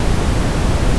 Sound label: noise